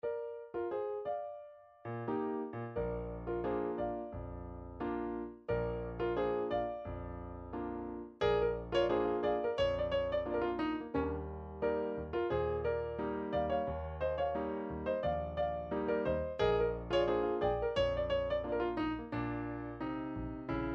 b flat blues, midi

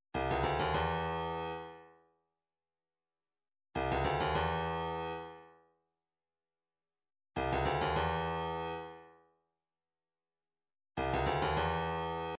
133 talking drum; Soweto

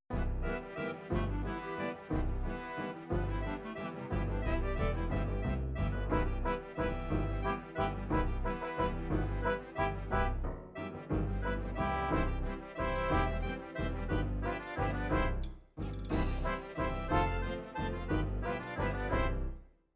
psr9000 waltz, midi

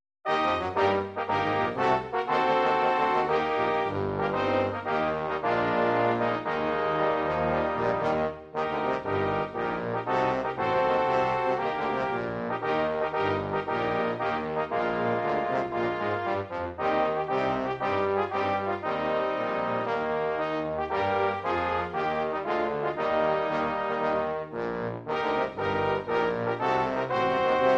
anthems mozambique

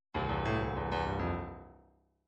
midi fills rock

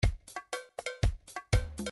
drums calypso, midi drums

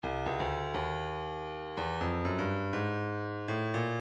percussion midi cowbells 121 cowbell; Stylus

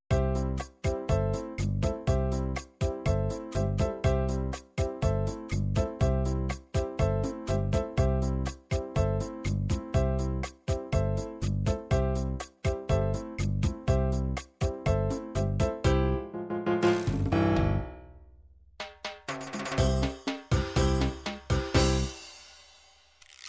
cha cha, midi

